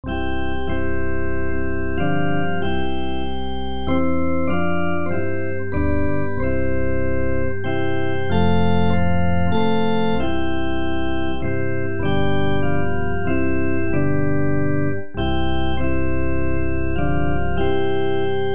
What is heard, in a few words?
instrumental hymns; thou hidden source of calm repose